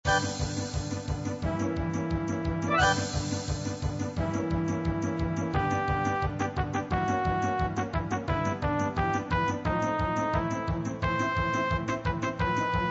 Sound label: galop